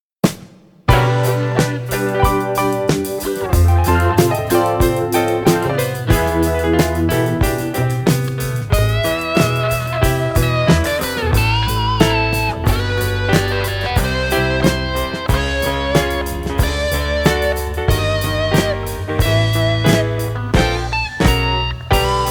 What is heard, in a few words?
(Instrumental Studio Outtake)